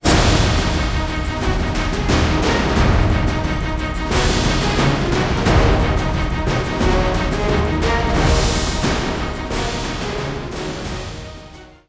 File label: Ship capture; music